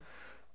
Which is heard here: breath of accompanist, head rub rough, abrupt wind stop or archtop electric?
breath of accompanist